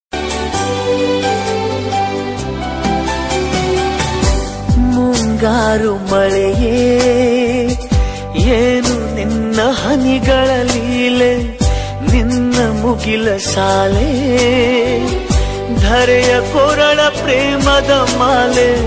rain status kannada rain

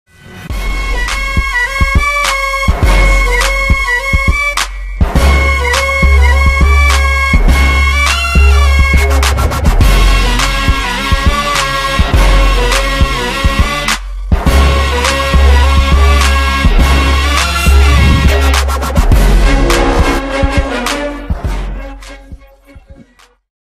villain bgm, telugu; sharwanandh; lavanya tripathi; ravi kishan

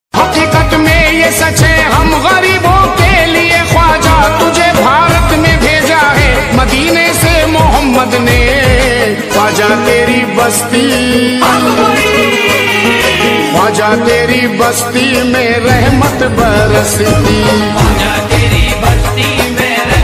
qawwali ringtone

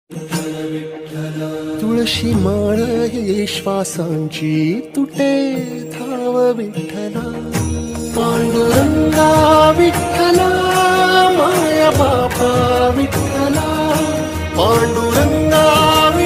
popular bgm ringtone marathi

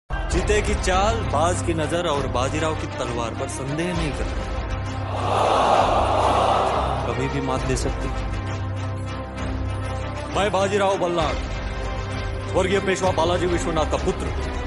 attitude ringtone; bajirao mastani

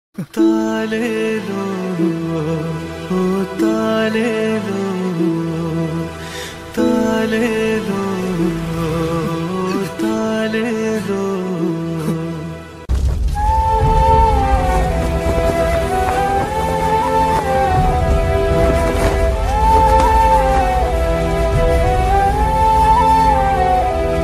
emotional heart touching bgm; thalaiva vijay

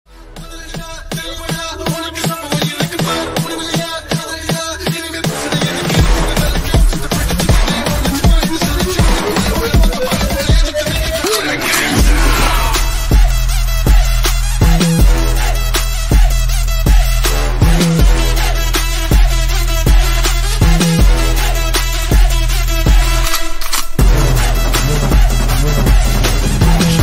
thunivu bgm

